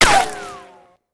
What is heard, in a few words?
Bullet Ricochet; Sound Ideas; Guns